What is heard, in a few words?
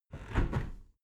window close soft